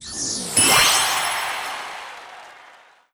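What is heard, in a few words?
Sound Effect System Mission Clear; Se Sys Mission Clear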